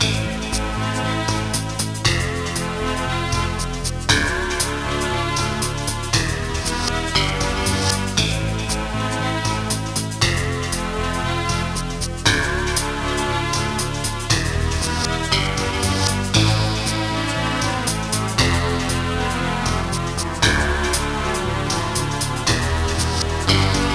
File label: Battle Music; AmoebArena